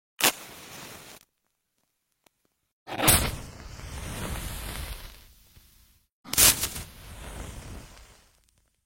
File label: match lighting on